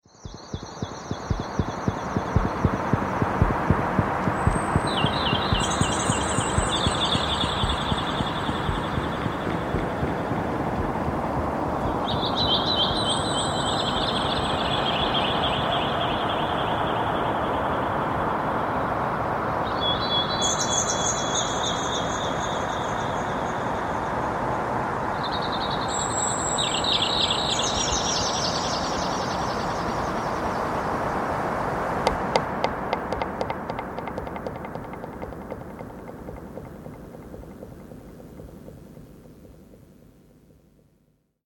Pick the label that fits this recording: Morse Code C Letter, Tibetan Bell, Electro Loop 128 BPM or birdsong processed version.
birdsong processed version